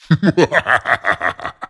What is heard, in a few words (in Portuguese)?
risada do mal